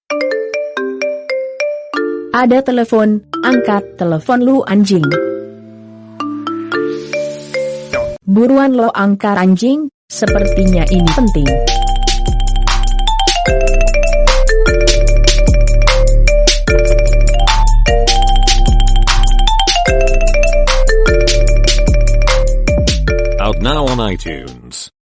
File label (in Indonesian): Nada dering telepon ngegas